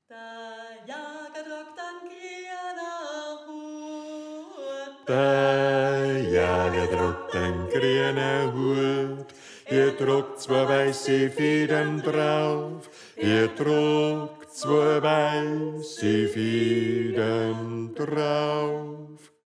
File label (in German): Bass; Da Jaga Tragt An Greana Huat